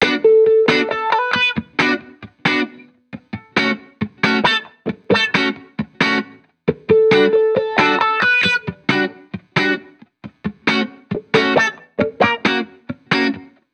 Guitar, 135 bpm, key B minor, Back to the 70s Guitars; Fox Samples; Full Mixes